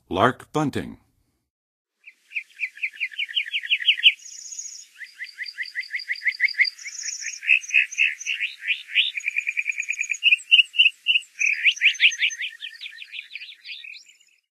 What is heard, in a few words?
Lark Bunting, Songs of Birds of North America; Western USA; Western USA Songbirds